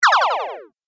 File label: Game Laser Pulse, Video Game Sound Effects